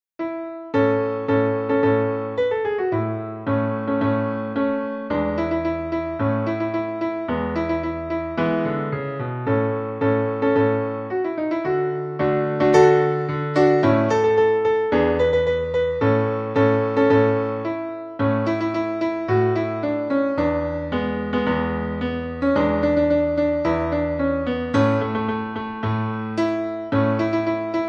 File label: march, guitar